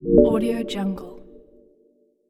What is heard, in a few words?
harmonic ui